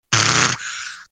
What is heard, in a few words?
fart noise